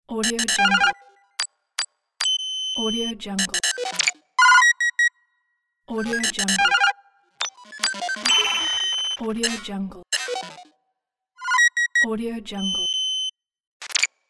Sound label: digital device